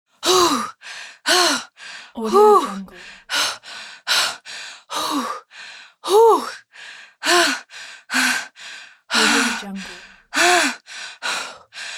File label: female out of breath